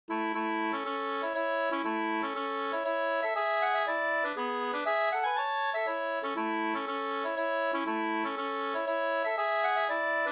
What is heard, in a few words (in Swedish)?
polska Bodapolska